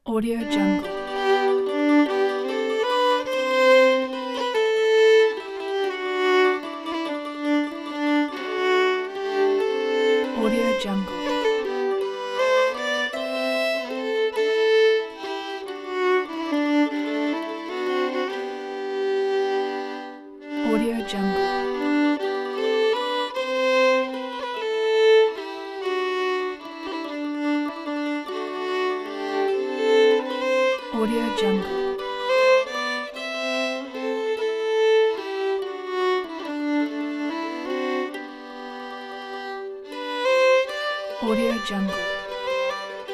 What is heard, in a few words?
slow irish fiddle